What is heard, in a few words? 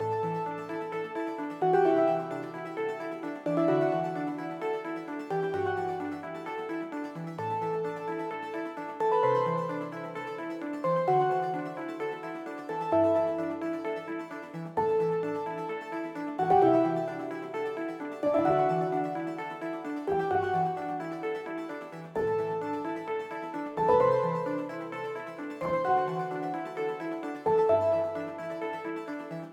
Music Victory 130bpm; Sockventure